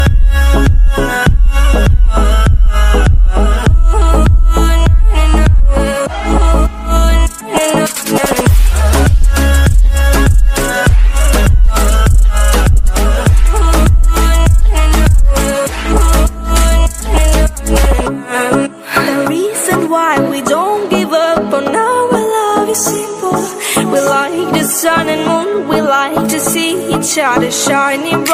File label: remix english ringtone; english ringtones